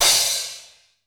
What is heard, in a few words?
XE8 CRSH; Crypton - DrumBase5000